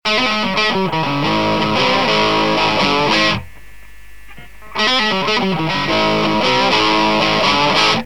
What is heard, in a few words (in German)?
angezerrt; elektro